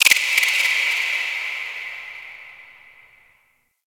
Ocean Underwater Critter Small; Warframe; Grineer Ocean

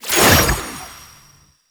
Rune Pickup; Warframe; Entrati; Mirror Defense

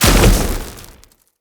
Casting Device On Damage Impact; Weapon Impacts; Casting Devices

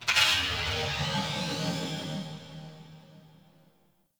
Tech Moan Texture Med, Corpus Ship ambience; Textures

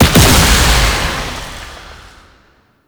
Void Fissure Close; Warframe; Entrati; Conquest

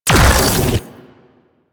Flame Laser Fire; Warframe; Corpus; Corpus Railjack; Spec Ops Fire Moa

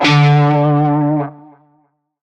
Shawzin Flourish Oct Two D; Music; Warframe; Narmer Shawzin

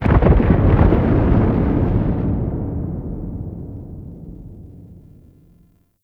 Moon Earth Crack Large; Ambience; Warframe; Orokin Moon

